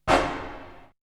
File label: Hit; ZERO G - Datafile DISC 1